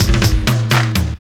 120 fills; Sound & Vision - Gigapack I

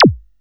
ZAP KIT C4; USB Soundscan vol.20 - Fresh Disco House I

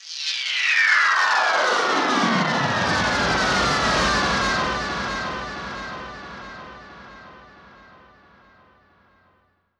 DECENSION, SCRAPE, Guitar; Spectrasonics; Bizarre Guitar

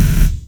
DRUMMM FREAK, DRUMS + PERC; Rubber Chicken Software Sample Bank

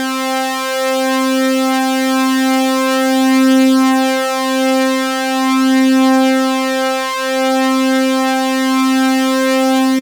Synth, Raw Analog Multi's; Dream Zone

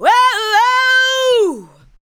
ADLIBS

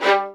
LDVLN1 ATT G, LOUD VIOLINS; Q Up Arts; Denny Jaeger Master Violin Library

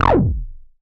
bass F1, Korg MS-20

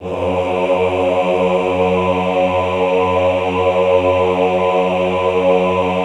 AHH F#1, Classical Choir; Best Service; Extended Classical Choir; AHH LO+HI LL

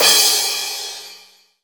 CYMS, DANCE

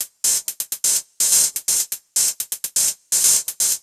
drum loop body hat 125, Classic Chicago House; Sample Magic; Drum Loops

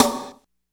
DR660 DANCE, DRUMS PERC; Rubber Chicken Software Sample Bank

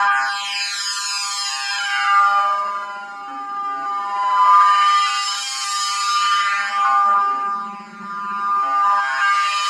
ROCK CHAIR, Pads; Distored Reality 1&2

